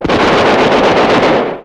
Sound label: 50cal